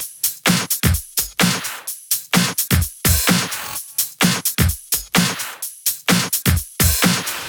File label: 128BPM Kit; Vengeance Samples; Morpheus Kit